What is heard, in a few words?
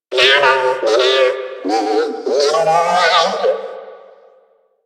Repair Drone Idle Happy; Warframe; Necramech Repair Drone